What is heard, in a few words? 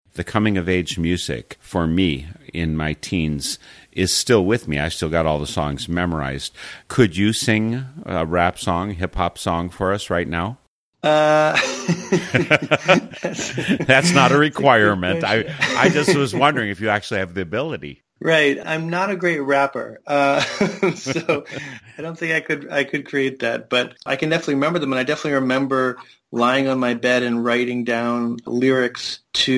music